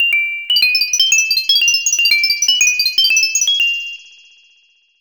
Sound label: Battle Bells (Doom Reverb); super mario rpg 1996 snes